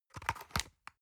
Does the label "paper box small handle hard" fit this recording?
Yes